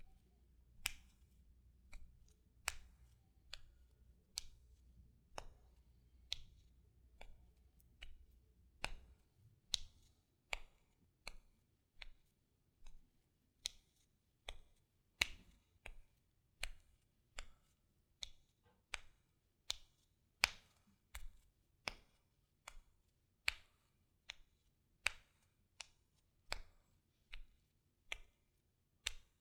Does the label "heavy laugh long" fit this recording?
No